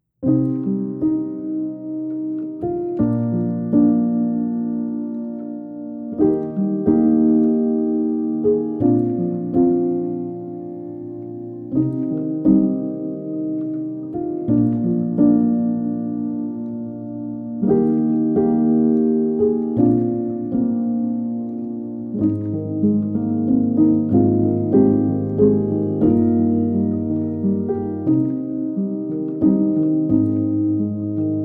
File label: sleep piano; Nausicaä of the Valley of the Wind